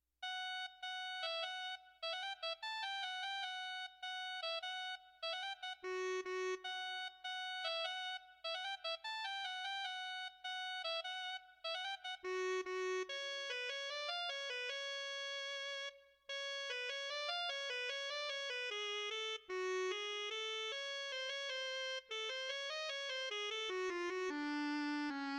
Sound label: Arabian; Musician; RuneScape 3